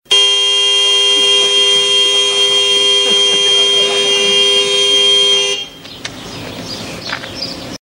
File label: loud horn blaring